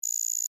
Device Readout, Scifi; Science, Fiction; Tech; BlastWave

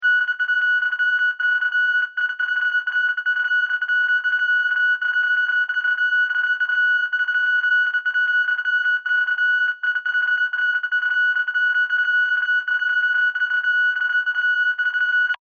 SciFi Scanner; Communication Sound Effects

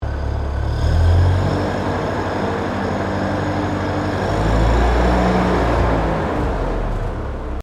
Tractor Furguson 1956 rev, Old Farm Tractor; Tractors; Vehicles

